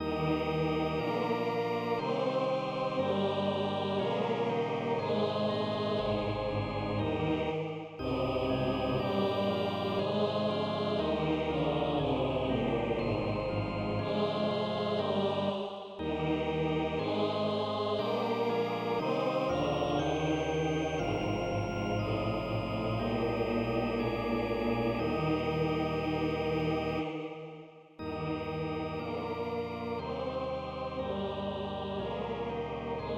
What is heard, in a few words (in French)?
Choral final Passion saint Jean Mib M Allemand Choeur et orgue; Allemand-Francais; Bach